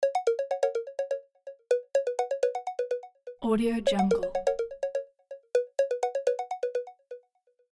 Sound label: incoming video call